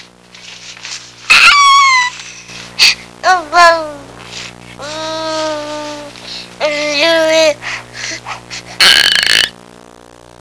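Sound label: happy baby; Sound Library 2000; Wayzata Technology; People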